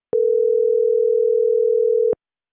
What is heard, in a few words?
ringing